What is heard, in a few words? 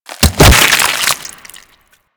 Infested Jetpack Pod Explosion; Warframe